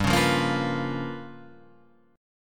G 7th Sharp 9th Flat 5th G

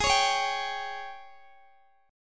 Ab Major 7th Suspended 4th Ab Guitar Open D

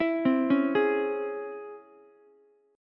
Db Minor Major 7th Ukulele, DbmM7 chord; DbmM7 arpeggiated; JGuitar

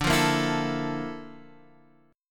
Bb Major Sharp 11th, chord

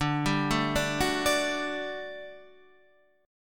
G Major 7th Suspended 2nd D

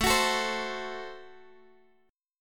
A Minor 6th A Guitar Open D

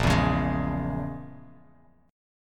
A sharp Minor 7th Flat 5th A sharp, Bass Standard; strummed; 6,7,11,13

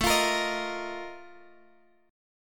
Bb Major 7th Suspended 4th, A, chord

